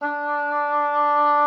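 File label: D4, oboe d'amore; Xsample VOL-7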